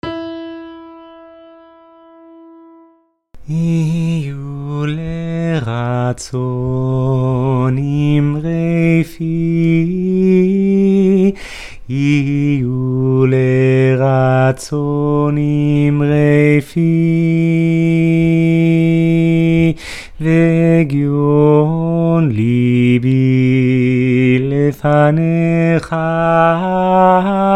yihyu lratzon bass; BCC choir

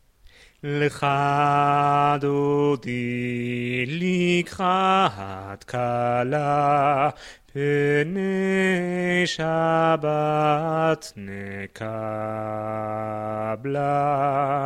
lecha dodi bass; BCC choir

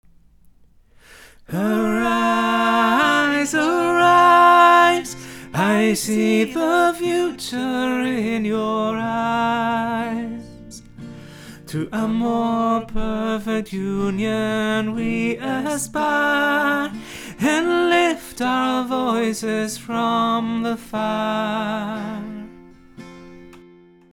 Arise tenor; BCC choir

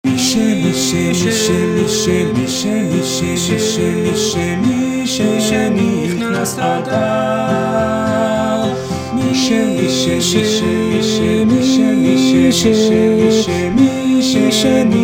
Mi Shenichnass Adar both voices

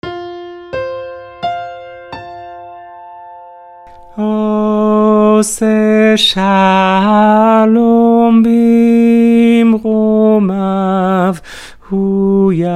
spanish soprano; BCC choir

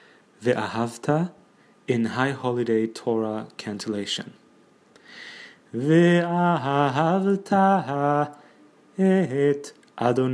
Vahavta Trope

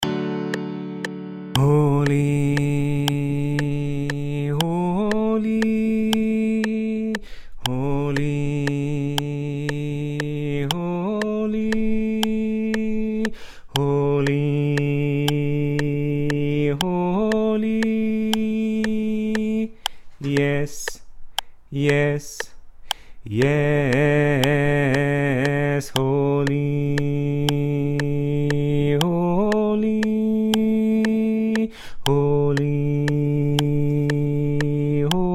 music of heaven bass; BCC choir

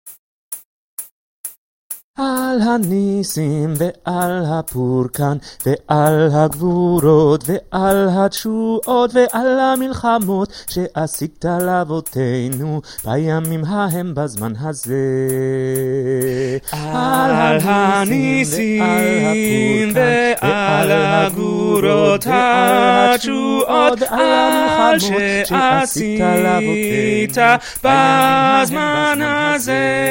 al hanissim all together, Choir Music; BCC choir

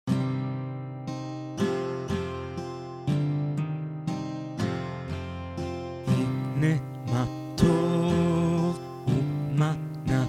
hineh ma tov voice

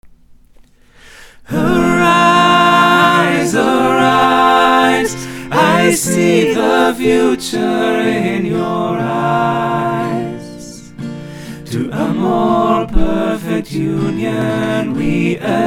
all vox, choir music; BCC choir

